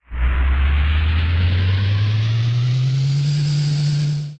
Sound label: Platform Ascend; Jabu Jabu